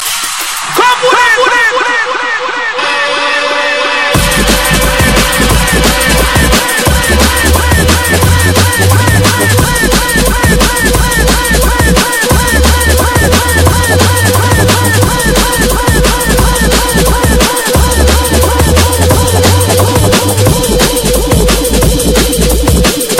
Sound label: Drum & Bass; Ragga Style; Frontline Records